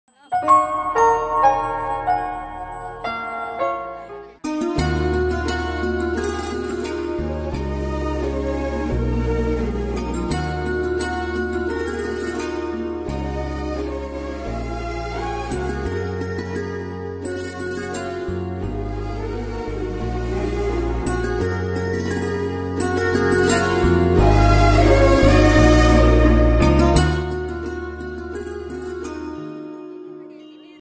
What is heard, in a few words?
telugu new bgm music love; yamudu; surya; anushkashetty